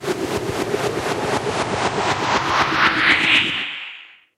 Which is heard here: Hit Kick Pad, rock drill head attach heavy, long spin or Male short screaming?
long spin